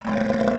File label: HURT; mahlendur